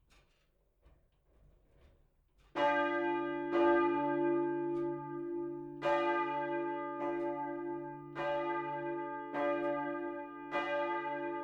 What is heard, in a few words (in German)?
Glocke